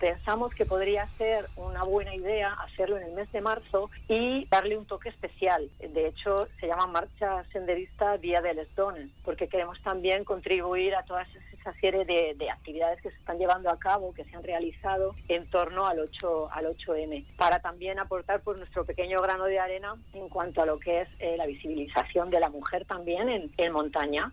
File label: AMBIENT